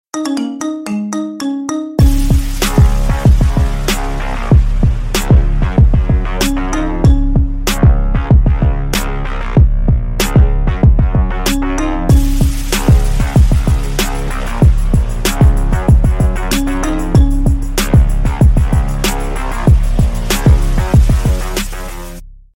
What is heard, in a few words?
marimba remix; Рингтоны Без Слов